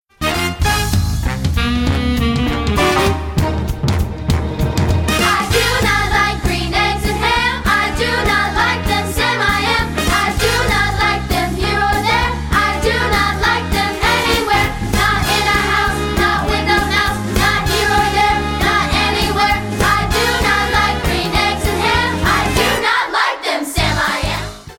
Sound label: Vocals